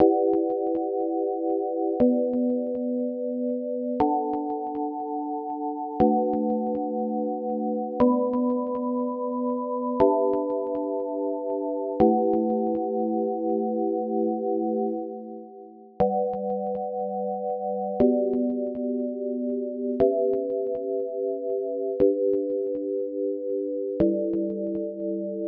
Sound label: Corridor Chords